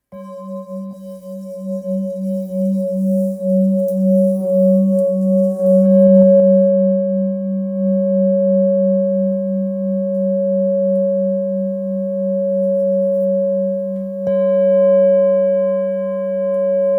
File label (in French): Bol full moon fa dièse 815g